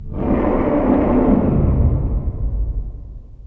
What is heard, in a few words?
dragon roar distant; eqclassic